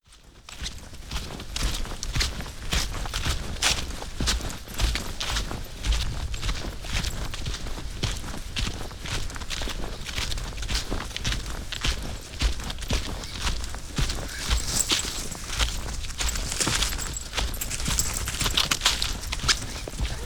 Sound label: hiking up beech hill; hiking up beech hill session